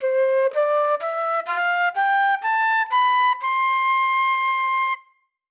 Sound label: flute